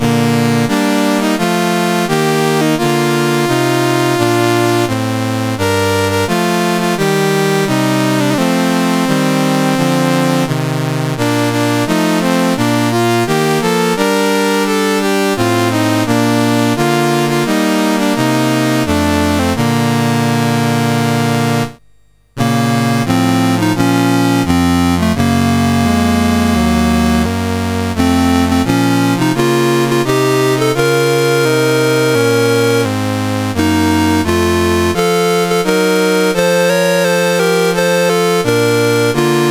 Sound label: CSG8580R5, National Anthems; Pakistan